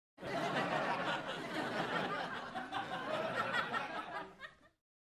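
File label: Crowd 100 Light Steady; medium int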